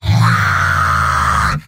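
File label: yell, robot; heavy; mvm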